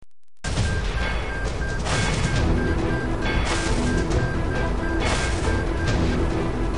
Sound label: aim9 ECM; f22; simulasyon